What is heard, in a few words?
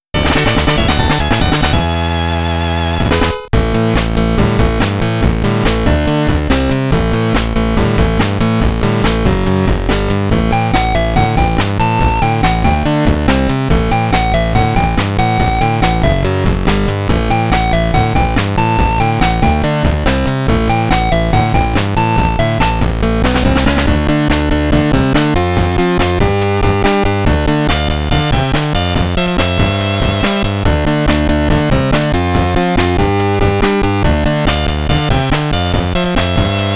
Battle Victory!